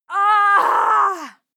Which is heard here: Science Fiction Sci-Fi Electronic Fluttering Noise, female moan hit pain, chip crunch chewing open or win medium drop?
female moan hit pain